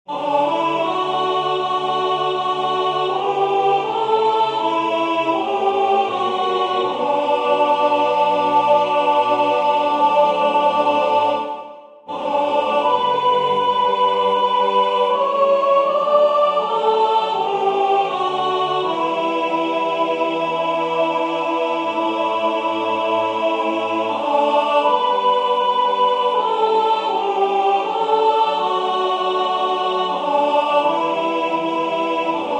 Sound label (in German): Sopran; Den Tag, an dem wir feiern